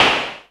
ELEK SNR; ELECTRC

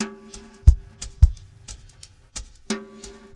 LIQUID, SWINGTOMS; Spectrasonics; Liquid Grooves 1 & 2

